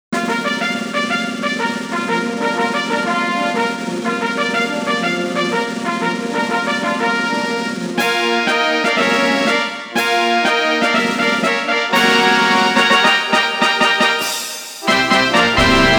GI Race Fanfare; Kyoto - Hanshin

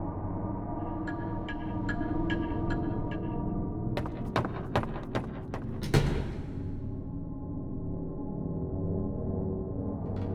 Living room; Toy Odyssey The Lost and Found

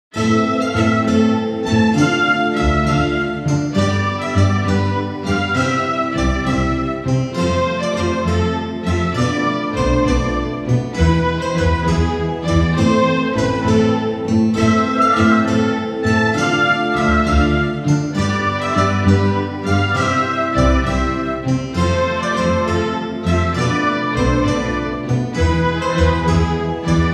Baroque Beauty

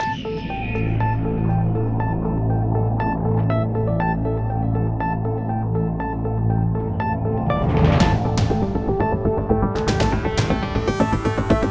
level fail

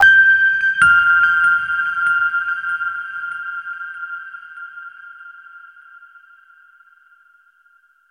Stinger Puzzle Tone

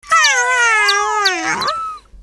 China Hit Sound; Jolly Battle; Android

